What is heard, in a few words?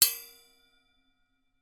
impact metal small resonates